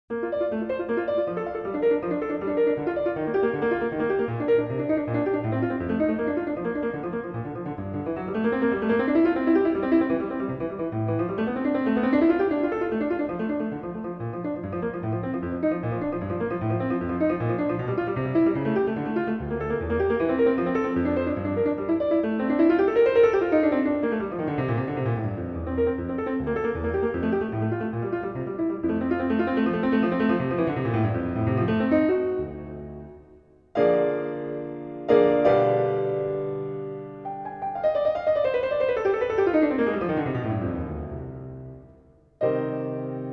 Prelude in B-flat Major, CLAVIER; BWV 866; BACH; WELL-TEMPERED CLAVIER

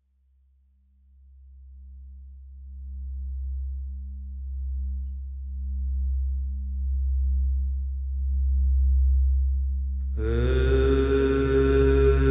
overtonal singing; Chakra 1; Yoga and sound

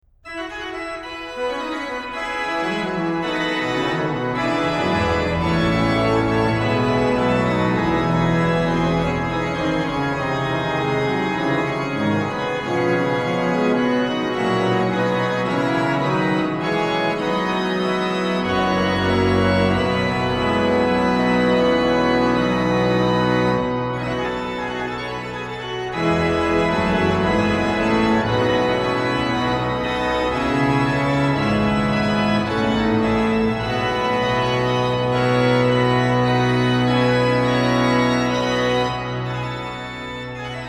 Lobt Gott, ihr Christen, allzugleich, organ; BACH; BACH ORGAN WORKS; CHORALE PRELUDES

